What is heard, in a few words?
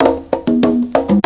CONGA 97